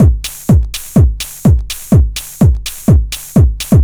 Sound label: DEEPHOUSE BEATS 125